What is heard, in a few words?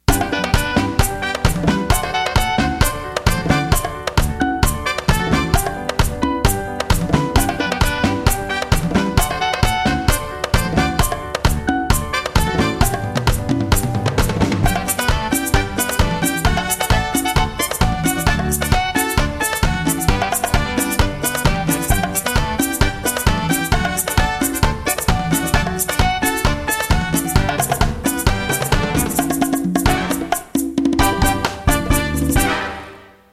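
Merengue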